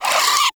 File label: Vocal Attack, Hunting Critters; Nexifera